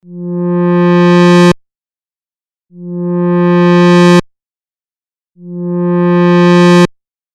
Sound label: ADSR